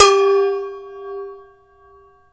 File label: BOWL RING; PERCMETALIC; Rarefaction; Poke in The Ear With A Sharp Stick